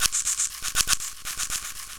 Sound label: Multi Match Beat, Electronic Beats; Electronica